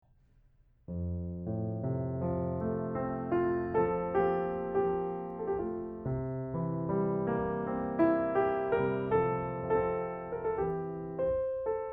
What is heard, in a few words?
Fmaj